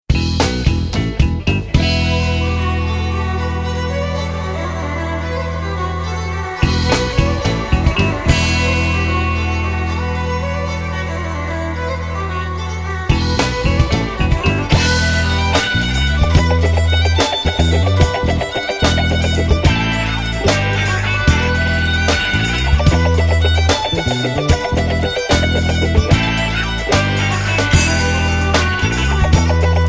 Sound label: jig, folk